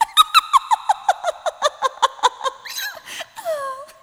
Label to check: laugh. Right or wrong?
Right